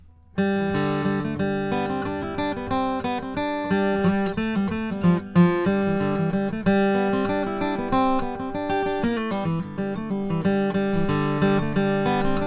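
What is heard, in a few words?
Martin D acoustic guitar; 1983; martin 150th anniversary; d41 braz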